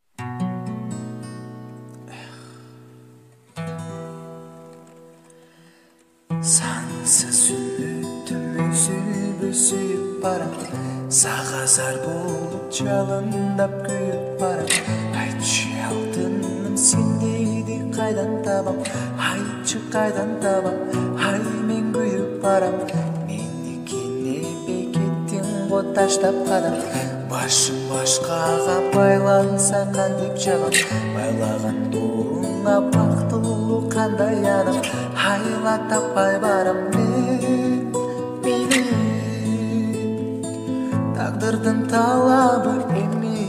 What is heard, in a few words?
gitara